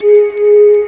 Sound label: DG WAVE, DIGI SYN